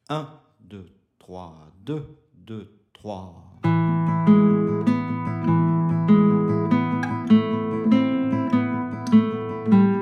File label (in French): exercice 100bpm, guitare